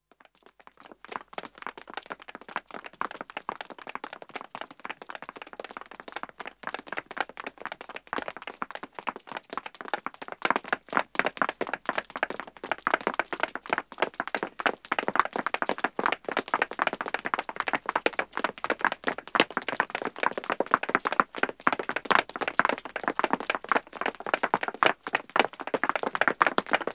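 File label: Pavement Running 2 men & 2 women, Footsteps; BBC Sound Effects Library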